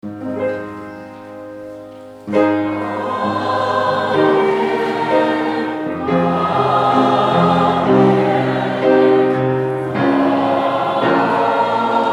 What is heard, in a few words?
Amen